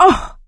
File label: hurt minor, survivor, voice; source engine; left 4 dead 2; producer